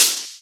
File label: Open HH, Cymbals